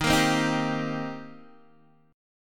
D sharp 6th, D sharp, down, Guitar, DADGAD

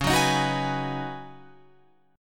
Db 9th Suspended 4th Db down Guitar Open F; strummed

